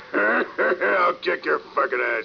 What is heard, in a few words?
aah kick